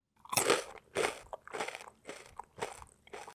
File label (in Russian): hrust suharej